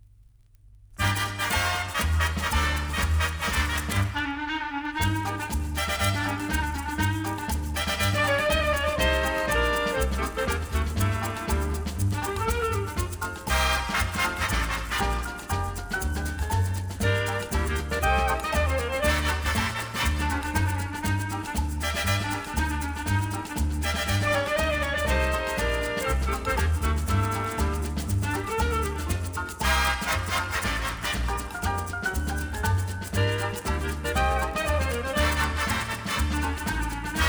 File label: orchester sevilla samba